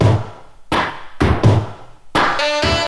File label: 84 bpm 00s disco drum loop a key, Free breakbeat - kick tuned to the A note